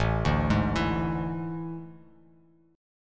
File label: A Major 7th Suspended 4th, Bass Standard; AM7sus4; arpeggiated; JGuitar